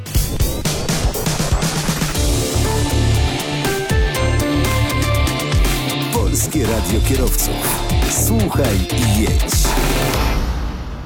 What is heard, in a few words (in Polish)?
jingiel